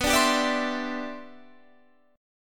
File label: G 6th Add 11th B, chord; G6add11/B strummed